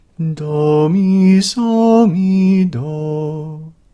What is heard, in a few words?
Major Triad